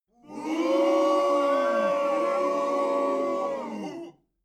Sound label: boo short